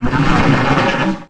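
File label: droid; Star Wars Knights Of The Old Republic I; XBOX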